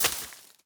environment zone metal thread hit

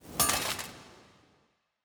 ambience room forge armor ready

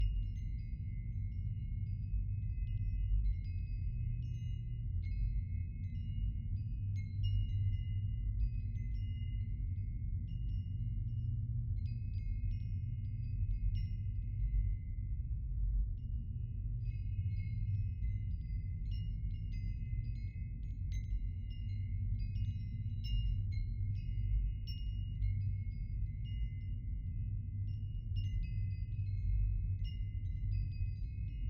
ambience room base loop

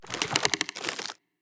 tree golem big strike; Shelter

